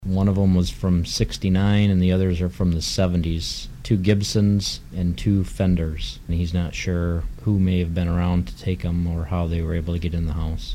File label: guitars